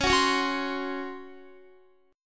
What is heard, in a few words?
Db Major 7th Suspended 4th, Guitar; strummed